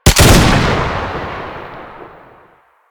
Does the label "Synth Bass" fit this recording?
No